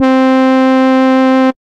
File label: Mono Trumpet, Operator, Brass; Instruments; Ableton; Factory Packs; Synth Essentials